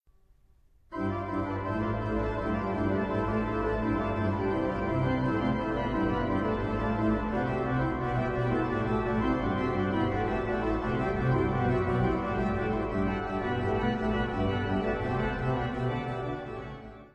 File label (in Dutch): Psalm 43 Orgelbewerking